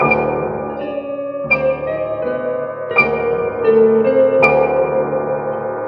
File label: piano and guitar, Sad Loops